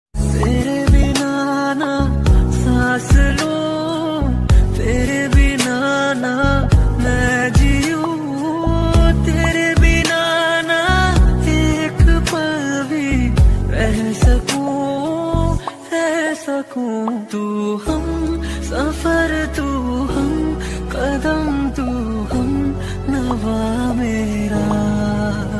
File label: Love Song Ringtone